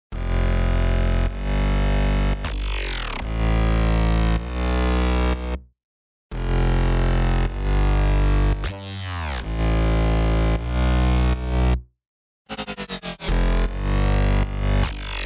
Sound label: Future Bass Bass FX